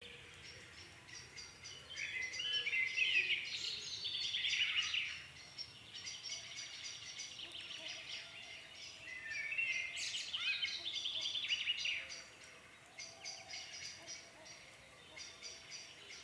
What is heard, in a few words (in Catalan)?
Biofonia crepuscle Camí Binifaldó